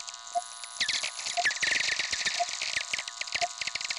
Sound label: tweek, Tweeks observed at Kagoshima; vlf